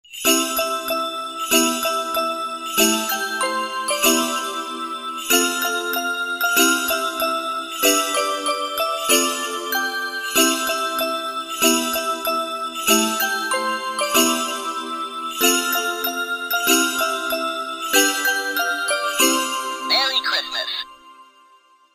christmas ringtone

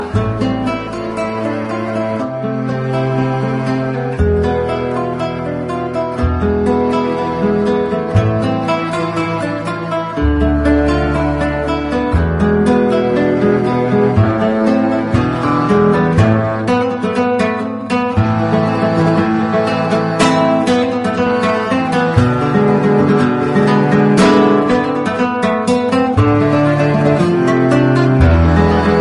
best guitar

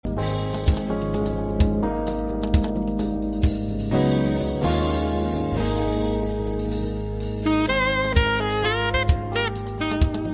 nice sax; Jazz

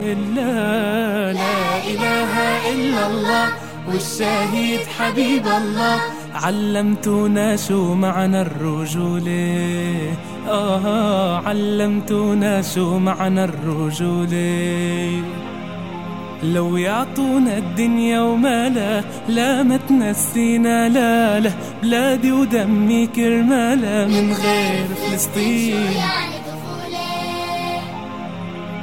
children nasheed

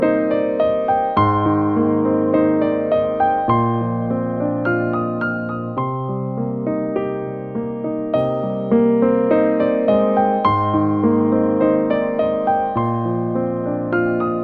night music

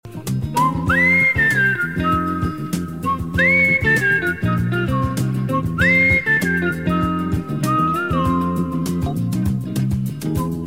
whistle; Turkish Delight

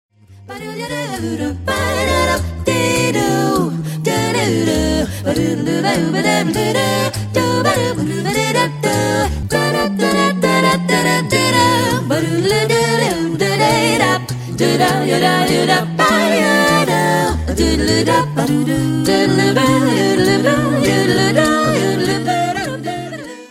jazzy acapella

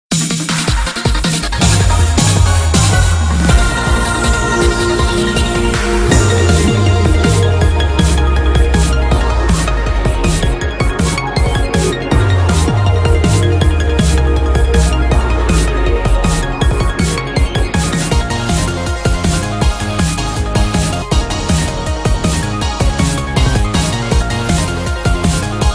victory fanfare; Final Fantasy XIII

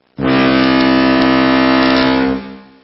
alarm; Spongebob

